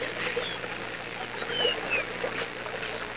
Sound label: water swamp